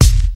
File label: short kick f key